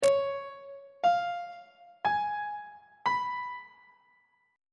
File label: d flat dominant 7th chord; on treble clef